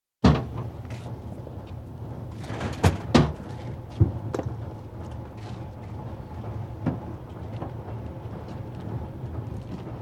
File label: General activity at scene of the fire; City; Cities; Fires; BBC